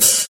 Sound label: HH, Dance Mega Drums; Best Service; HH-HIP